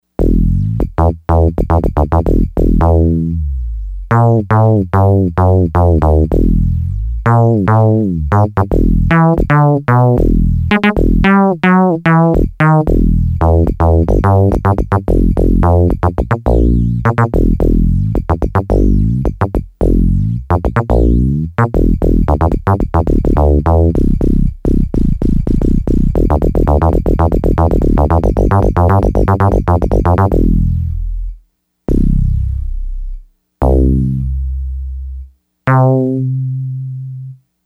Mono Bass, Yamaha DX100; Factory Patches

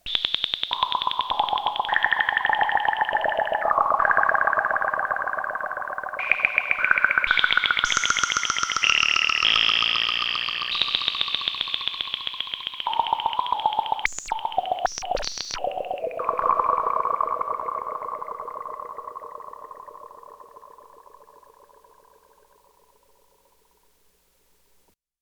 Bubbles, Roland JX-8P

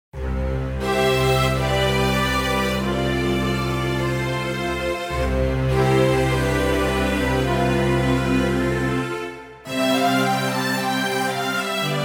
Bright Strngs, Roland JV-880; Factory Patches

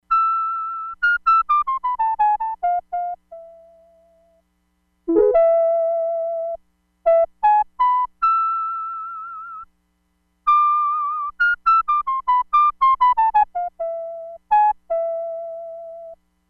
FLUTE; Factory Programs

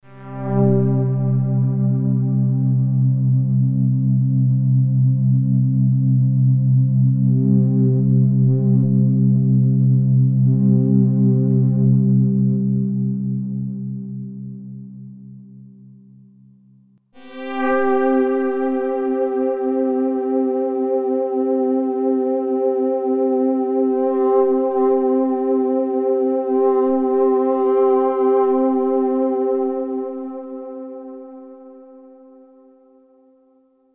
Soundtrack full, Roland D-50; 37 Soundtrack

